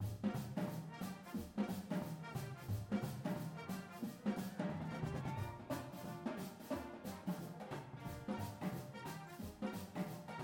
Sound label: full drumline w music